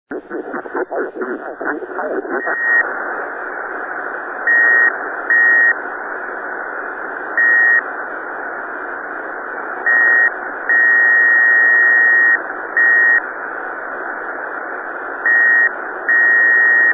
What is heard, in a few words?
noise; KC0GDN